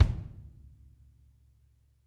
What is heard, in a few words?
Kick, Drumkits